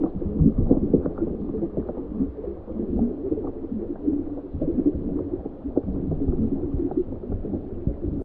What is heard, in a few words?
scuba calm; server-nalc; ambience modpack